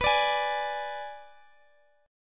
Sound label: B Minor 6th B; Bm6; strummed